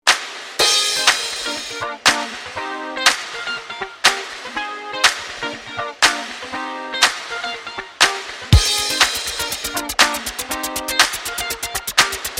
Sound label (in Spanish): ritmo; disco strummer